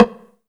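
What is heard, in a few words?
drums, Pure Electro; USB Soundscan vol.03; AKAI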